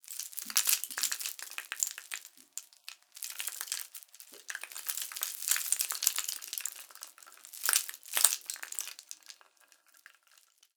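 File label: rattlebone, percussion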